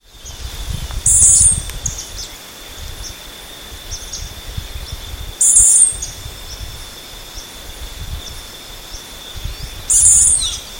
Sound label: Haplospiza unicolor; PN Itatiaia